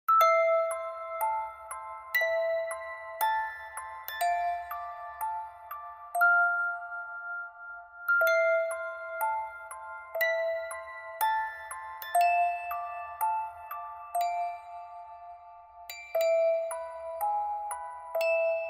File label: Sad Music Box